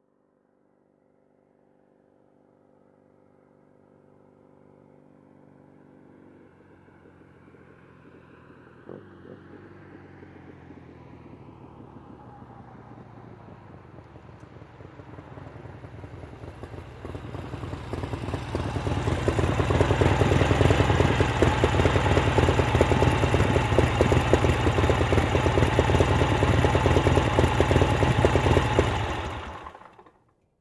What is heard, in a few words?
Motorcycle approaching and parking